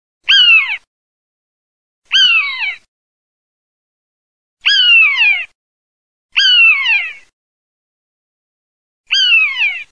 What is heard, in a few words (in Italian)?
FALCO POIANA